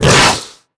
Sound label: grunt hard, headcrab; creatures; vox